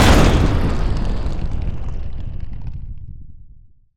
Explosion Medium Blast Scatter Debris Long Faint Tail Stereo; jasem just another shooter with electronic music windows 2017